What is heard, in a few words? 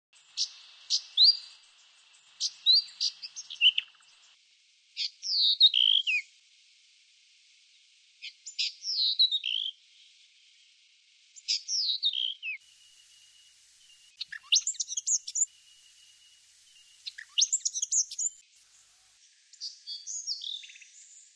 brown Thornbill; australasian warblers; canberra garden birds